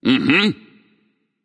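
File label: nonverbal agree; smite; voicepack; merlin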